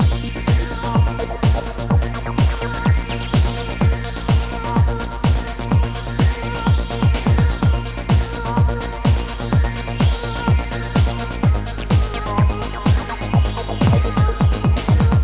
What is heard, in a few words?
MUSICA